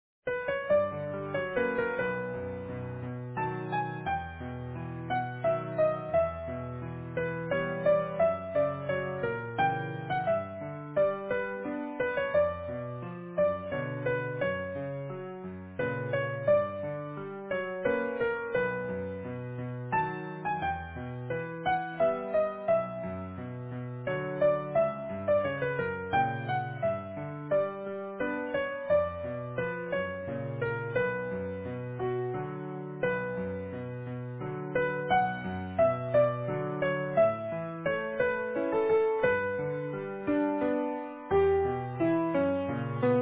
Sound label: Easy Piano